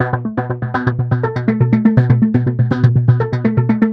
122 BPM Sequence Dmaj Bmin Tonic E; innovative sequences 1

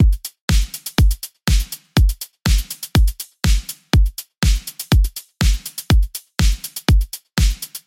Battery Full Drum, 122 BPM; full drum kit; Forge

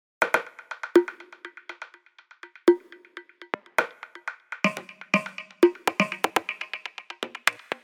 Congas, 122 BPM; mmb studio melodic

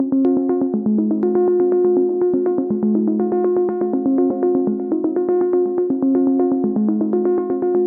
122 BPM Arps Cmaj Amin Tonic F; mmb studio soundtrack essentials

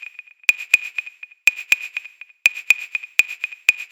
122 BPM Wood; MMB Studio Soundtrack Essentials

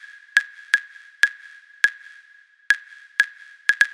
122 BPM Wood; mmb drum arsenal vol 1

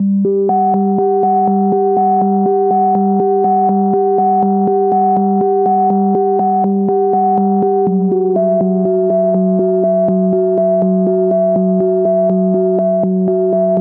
122 BPM Melodic Arps C maj A min Tonic E; MMB

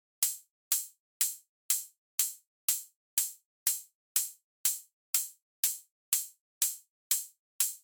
Hats Hi Hat, 122 BPM; Spectrum; percussion essentials 1

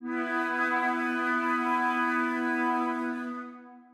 122 BPM Flutes C maj A min Tonic E; mmb studio soundtrack essentials

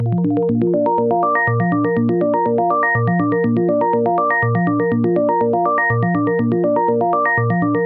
122 BPM Arps A maj G min Tonic A; Aura; mmb studio melodic

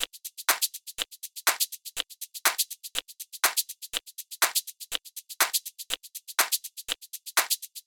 122 BPM Tops, percussion; MMB; Percussion Essentials

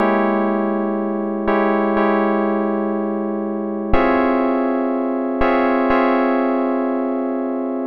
122 BPM Tonic G Gmaj Fmin Chord Progress; mmb chord progressions essentials

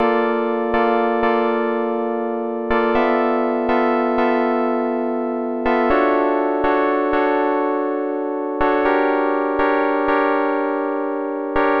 122 BPM Tonic D Dmaj Bmin Chord Progress; mmb chord progressions essentials

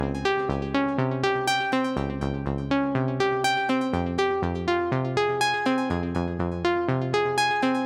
122 BPM Phrases Dmaj Cmin; Tonic C; mmb studio soundtrack essentials

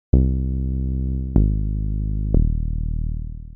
122 BPM Bass Synth C maj A min Tonic C; Pro Bass Synth Studio Kit; MMB

